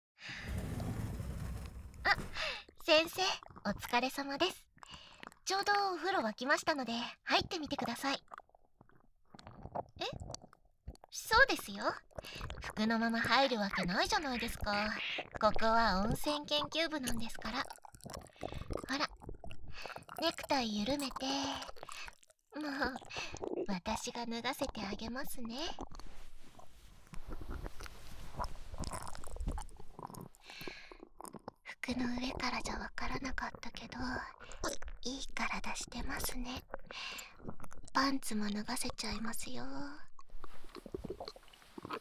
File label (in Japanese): 耳舐めされなが生徒会長にベロチュー・足指舐め・耳舐め・フェラ・乳首舐め・パイズリ・素股・クンニ・手マンし、騎乗位で絶頂する音声; 27分間耳舐めされなが生徒会長にベロチュー・足指舐め・耳舐め・フェラ・乳首舐め・パイズリ・素股・クンニ・手マンし、騎乗位で絶頂する音声